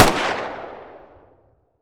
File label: famas distance fire; weapons